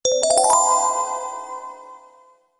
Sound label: Ipad Email Alert